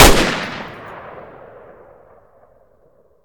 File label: M14 Alt, Guns Fire